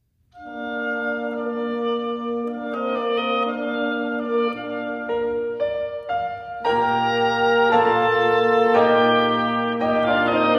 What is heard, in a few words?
mozart quintet